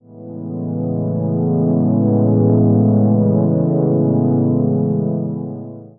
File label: ethereal ambient pad; 6 seconds